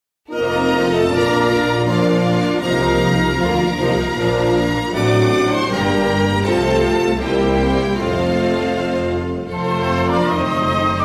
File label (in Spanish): Himno de isla de man